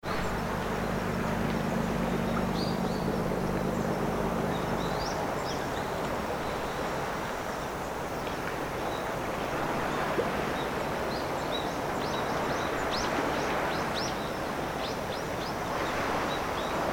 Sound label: wave bird